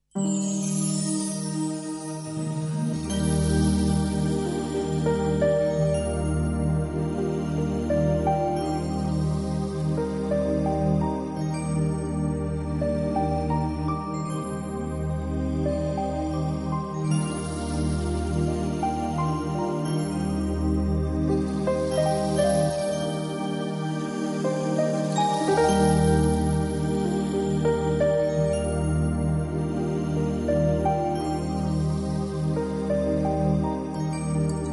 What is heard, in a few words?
Magical Explorations